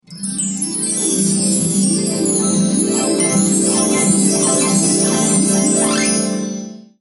Beautiful Harp Alert

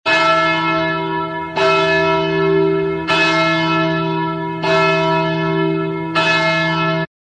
bell church ring

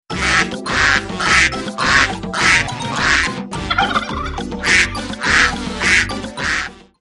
Chicken Rap Animal Alert

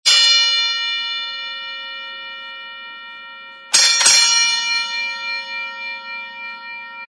bells bell fight rings

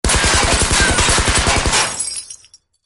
American Gangster Alerttone; Al Capone

